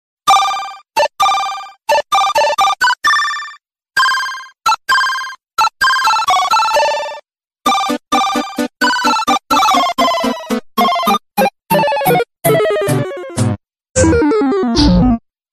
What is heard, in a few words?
funny low battery